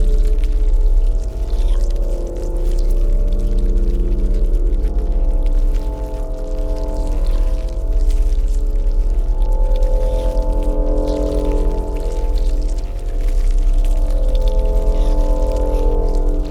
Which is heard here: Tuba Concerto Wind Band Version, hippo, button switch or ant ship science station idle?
ant ship science station idle